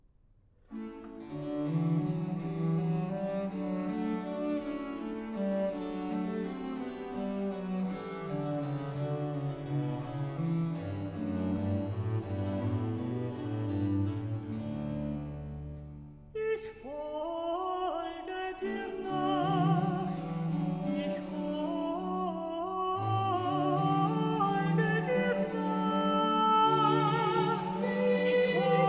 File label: Duet with Chorale Ich folge dir nach; Sehet, wir gehn hinauf gen Jerusalem, BWV 159